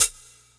rich top end hi hat a sharp key; Duration 0:01; Channels Mono

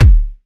warm steel kick drum D# key; kick drum samples; D sharp key

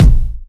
kick drum one shot f sharp key; kick drum samples

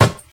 solid steel snare drum a sharp key

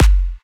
studio kick drum sound F sharp key; kick drum samples

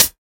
dark closed hi hat sound f sharp key; hat samples

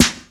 rap snare sample e key

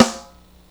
tight acoustic snare sample a sharp key

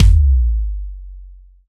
ambient bass drum sample a sharp key; kick drum samples

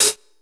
sizzle hat sound g sharp; g sharp key

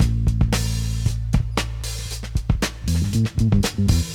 115 bpm hq drum beat A key

